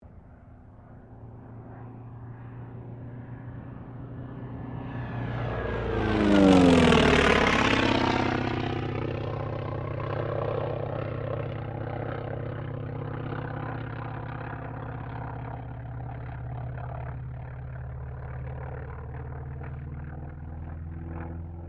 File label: 1963 T-28D TROJAN LOOP; Airplanes; Vehicles